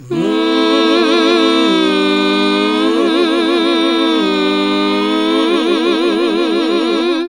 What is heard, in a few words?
GOSP., GOSP. MMMhh; Sound & Vision - Gigapack II CD 1 (Roland); HAL Gosp. Mmmh